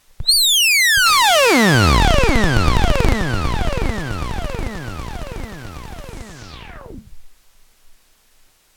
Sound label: square; synth; loops